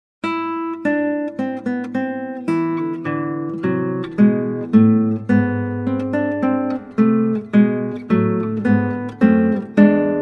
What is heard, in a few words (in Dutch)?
Klassieke Gitaar; Bach 50 Solos